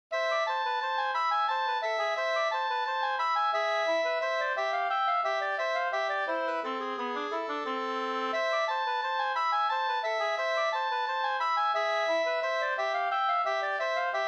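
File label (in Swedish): slangpolska, two-part; Sunhult Sm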